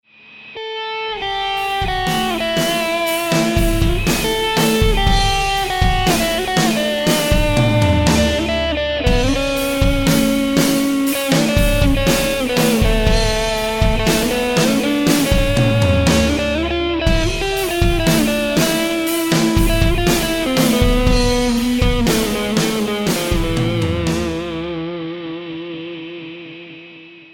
guitar improvisation

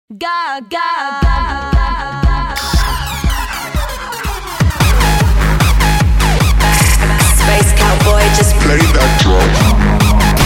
hardstyle mix

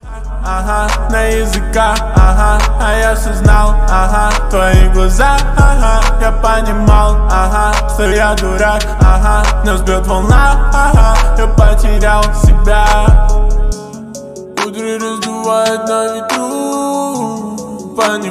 slowed reverb

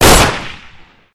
ak 47 shot

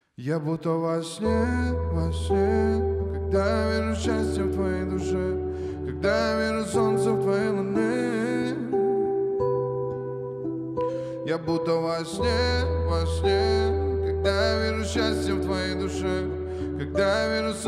acoustic

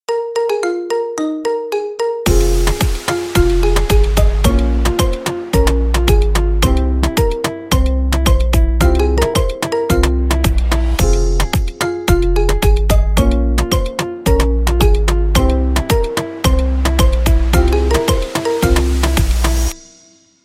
marimba remix

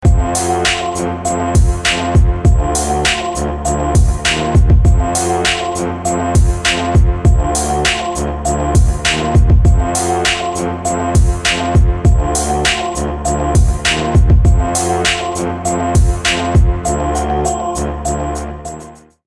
haunted house beat

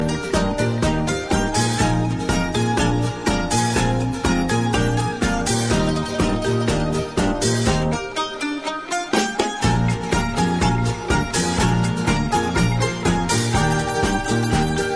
instrumental

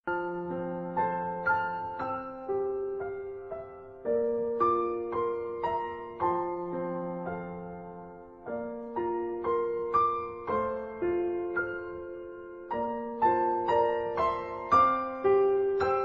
piano version; Kingdom Hearts; Roxas

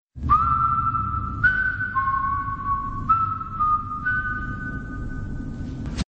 whistle; walking dead; governor

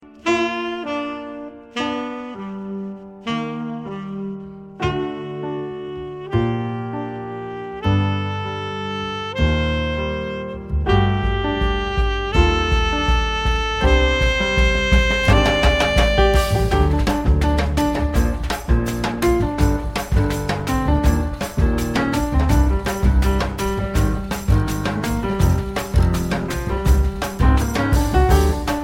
jazz, ringtone

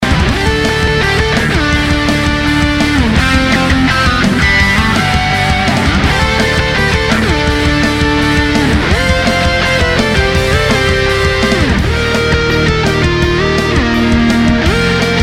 rocking electric guitar

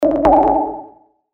sms

